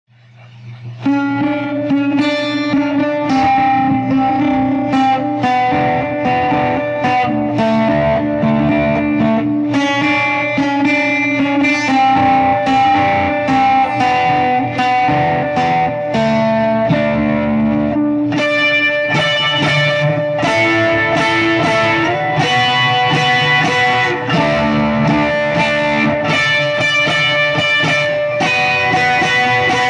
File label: e bouzouki